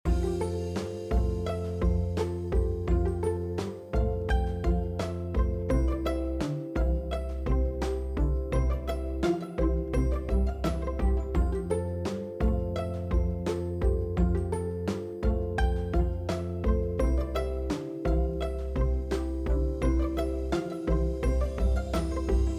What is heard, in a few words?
BGM Kaikoura